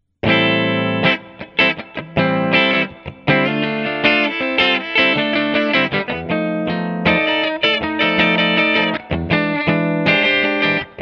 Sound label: clean bridge parallel, clean – bridge-PU in parallel; Manne Ventura